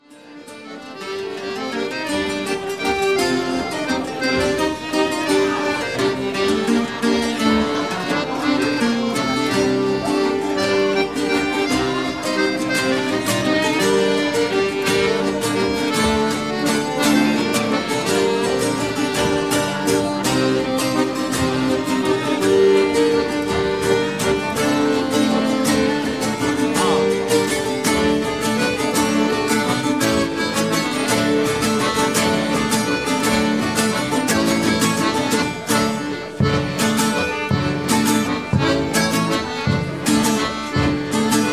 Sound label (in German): mazurka